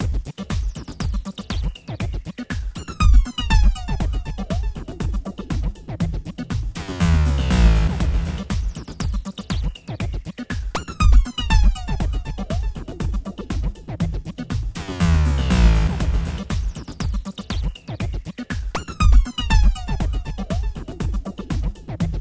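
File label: Swedish House Ring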